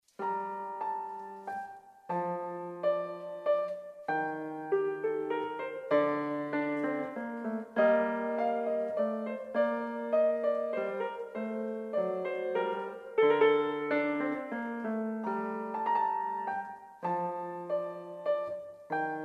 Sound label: Menuet G Moll; Bach